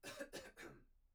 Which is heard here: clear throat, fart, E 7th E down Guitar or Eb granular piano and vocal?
clear throat